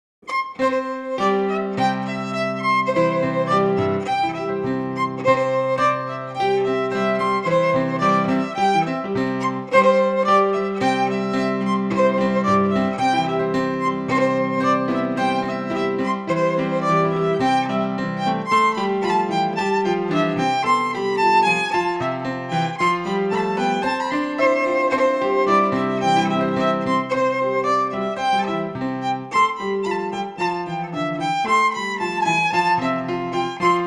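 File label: Quick Step, Scottish Fiddling; Morrison 1797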